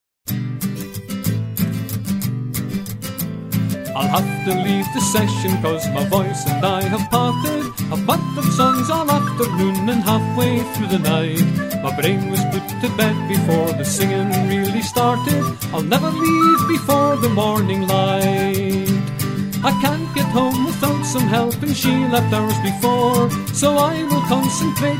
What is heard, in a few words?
Bodhrans and Banjos